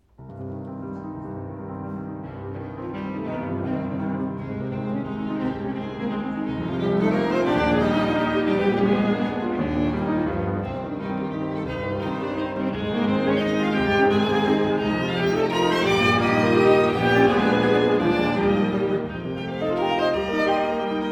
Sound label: piano; Fauré - Piano quartet in C minor; Festival Montalcino 2012